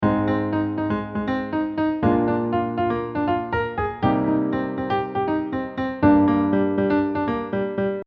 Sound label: Arpeggio